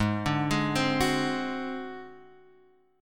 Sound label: Ab 7th Flat 5th Ab down Guitar Standard, Ab7b5 chord; Ab7b5 arpeggiated; JGuitar